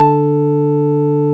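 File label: organ D2; Best Service; Advanced Media Trax Modern Composer; DANCE ORG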